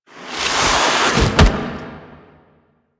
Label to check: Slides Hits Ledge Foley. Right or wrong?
Right